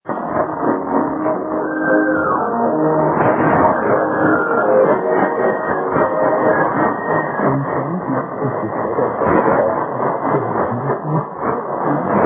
5960 kHz; DLR Kultur

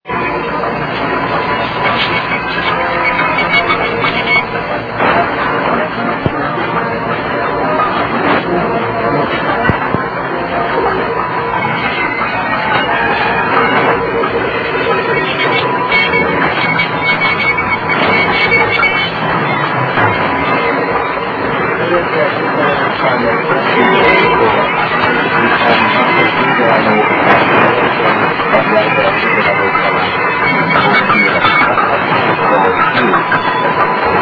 clarin

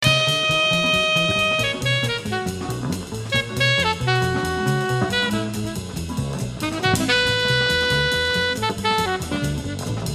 Jazz

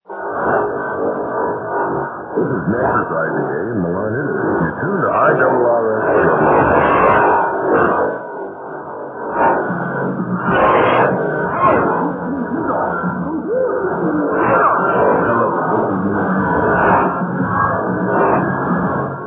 9385 kHz; IRRS SW

